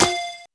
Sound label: bounce